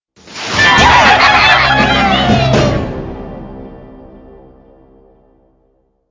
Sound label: level clear military